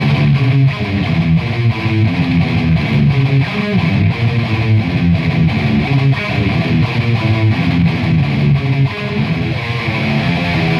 METAL

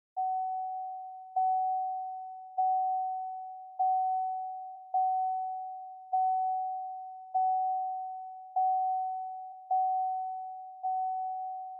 car door open

